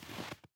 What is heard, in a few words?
snow step